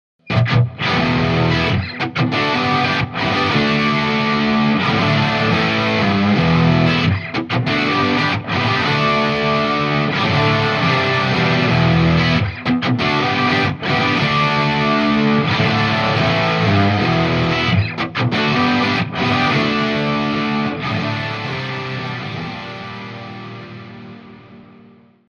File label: Crunch